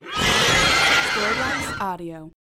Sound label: monster demon horses scream